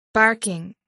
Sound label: barking